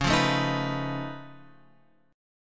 C sharp Augmented 9th C sharp down Guitar Open F, chord; C#+9; strummed; JGuitar